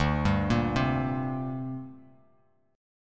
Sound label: D 7th Suspended 4th Sharp 5th down Bass Standard; D7sus4#5 arpeggiated